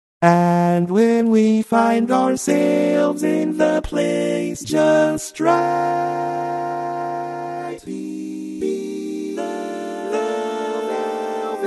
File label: Love and Divine, Lead, barbershop tags